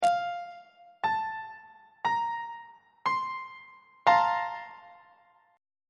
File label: b flat major 7th suspended 2nd 2nd inversion on treble clef